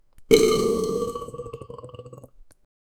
um simples plop vocal, bubble popping

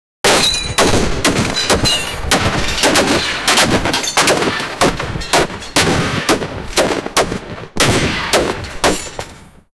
um grupo grande de soldad, swords

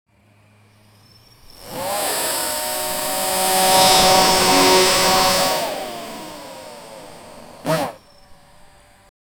som do drone dji mini

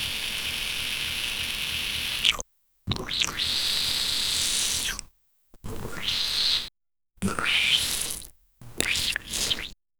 barulhos de sangue, gore

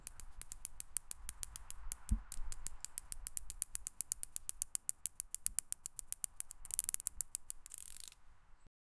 som de agulhas de bordar

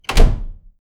porta fechando

